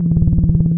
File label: fly loop, scanner; npc; Garry's Mod; hl2